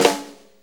Blues Snare Flam; Sonic Foundry (Sony Creative Software); Loops & Samples Drum Tools; Flams

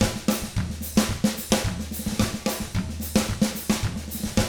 110 SMBA samba; ILIO; Double Platinum Drums 1

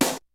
KICK SNARE; Kid Nepro; Elite; KICKS SNARES

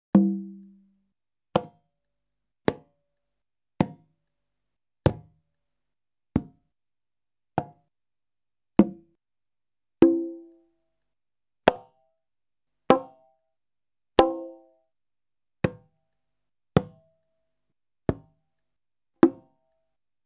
Salsa Picante; Masterbits; World Zone VOL-3

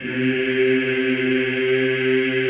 CHO C2, Eeh; Eeh + Ehh

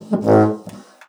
HUBU, BASSOON; Xsample VOL-6

